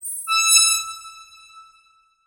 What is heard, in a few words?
Title Transition Bell; Adobe; Production Element